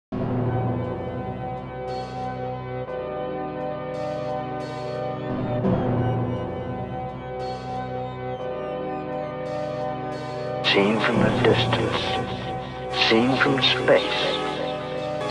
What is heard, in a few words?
Bass